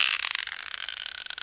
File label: rope bridge; Frogger 1997